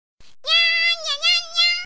cute nya message alarm

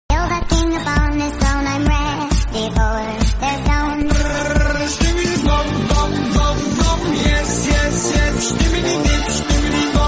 skibidi bop bop phonk

